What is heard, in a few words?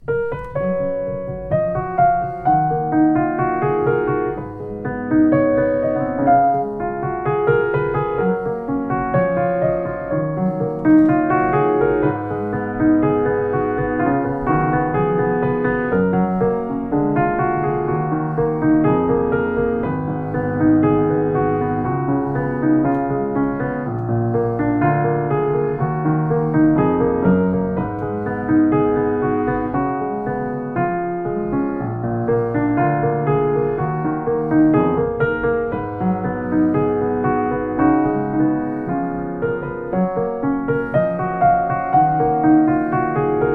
Rippen Concerto, piano